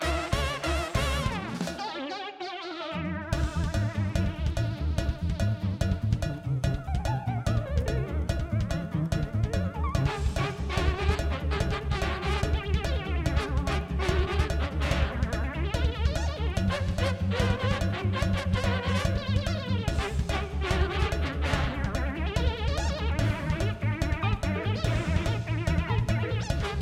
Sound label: Low Health; Larry's Runaway Train